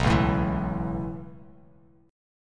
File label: B 7th Suspended 2nd Suspended 4th down Bass Standard, chord; B7sus2sus4; strummed; JGuitar